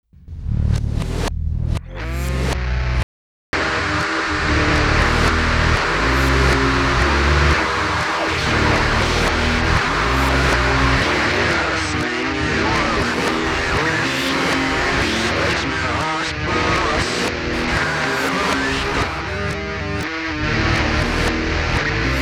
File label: reverse